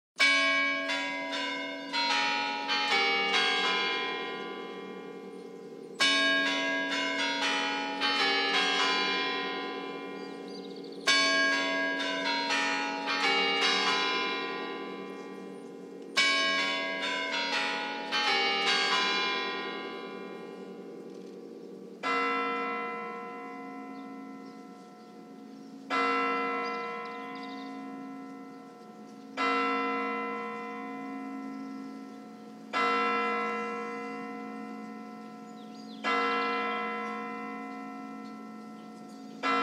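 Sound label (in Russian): Куранты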